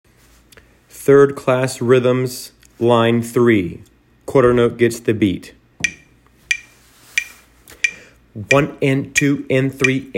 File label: rhythms